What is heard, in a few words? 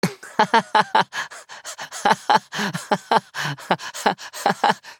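battle laugh; dqxi; eleven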